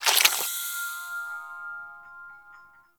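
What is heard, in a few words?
Power Shroom Projectile Pulse; Warframe; Nokko; Empowering Mushroom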